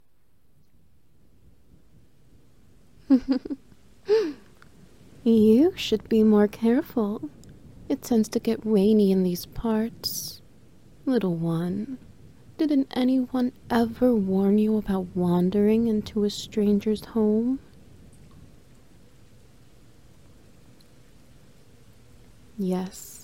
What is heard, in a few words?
SFX Wet Noises